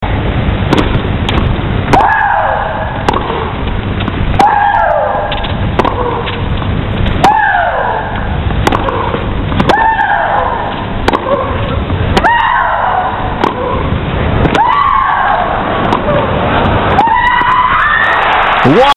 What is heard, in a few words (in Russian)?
kriki vo vremya pobednogo matcha